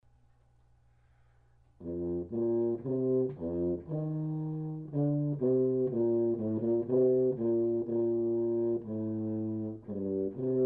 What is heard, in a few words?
prologue tuba